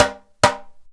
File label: IJEILU HITS; Best Service; World Colours